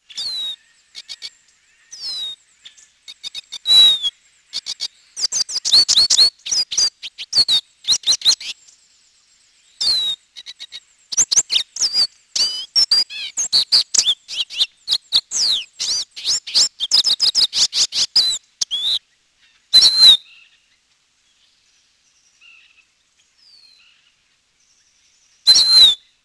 lesser goldfinch

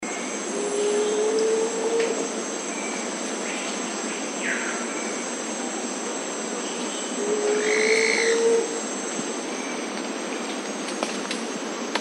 rufous vented ground cuckoo

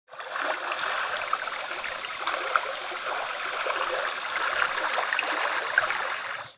blue and white swallow

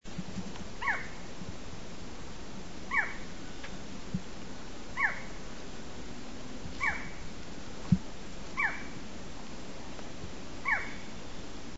barred forest falcon

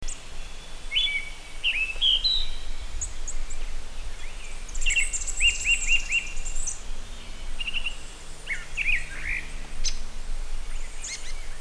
rufous collared robin

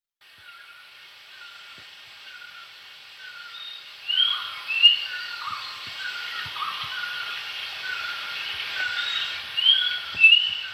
black winged cuckooshrike

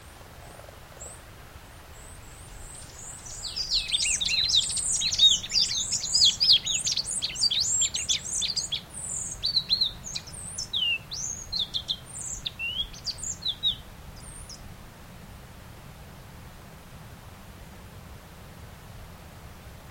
pale naped brush finch

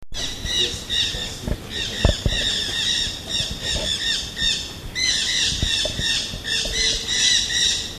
golden capped parakeet

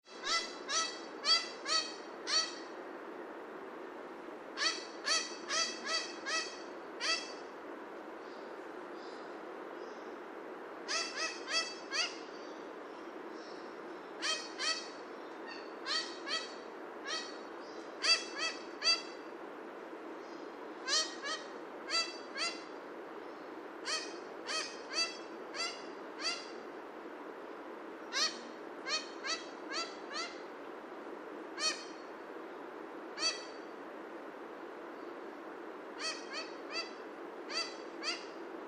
horned parakeet

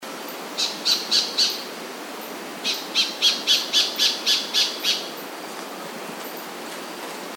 white faced robin

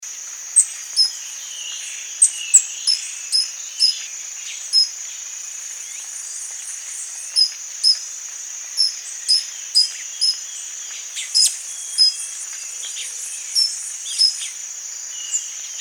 blue chested hummingbird

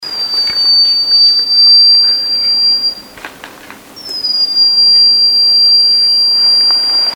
black throated robin

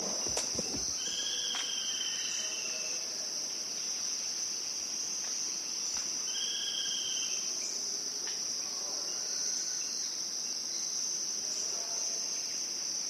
cinnamon banded kingfisher

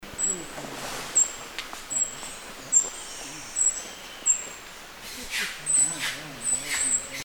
buff faced pygmy parrot

